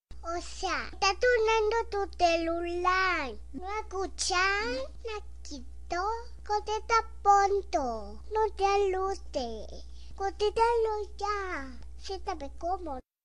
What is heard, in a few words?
ringtone